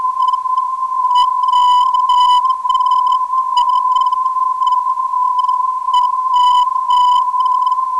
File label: 370kHz, ndb